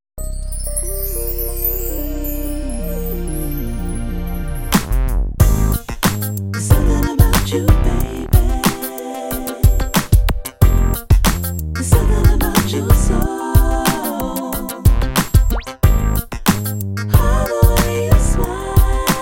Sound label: Roland FANTOMS